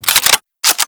Rifle Reload; Warframe; Tenno; Tenno Drake Rifle

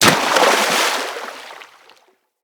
Melee Impact Water

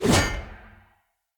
Polearm Heavy Whoosh; Weapons; Player; Sphere

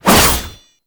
Shield And Sword Whoosh; Mortifor

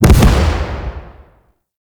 Grenade Explosion; Warframe; The New War; Kahl; Abilities

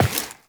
Step Default, Footsteps, Walk; Warframe; Tenno

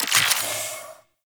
Infuse Gas UI; Warframe; Warframes; Alchemist

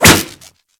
Whip Impact Default, Weapons

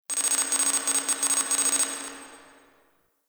Dojo Tech Beep; Ambience; Warframe; Mod Quest; Teshin's Hideout

